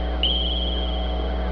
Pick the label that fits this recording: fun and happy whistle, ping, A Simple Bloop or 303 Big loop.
ping